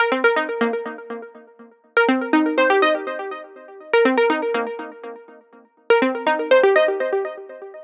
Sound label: 122 Cm Glo Fi, Melodic Loops; Electronisounds